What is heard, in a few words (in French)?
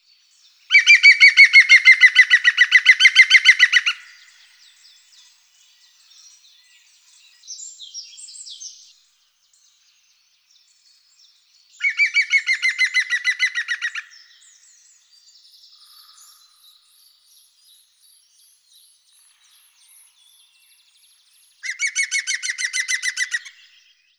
pic vert